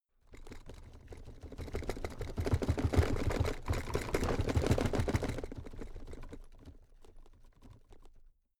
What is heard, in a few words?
drawer cabinet stuff rattle